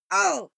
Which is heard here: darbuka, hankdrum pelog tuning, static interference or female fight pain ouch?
female fight pain ouch